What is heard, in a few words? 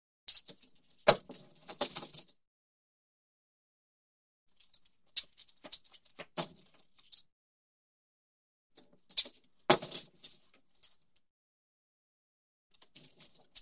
Coins Tokens Jingle; Hollywood Edge Foley Sound Library